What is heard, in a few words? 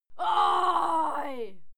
female cheer bawl; schreie; menschen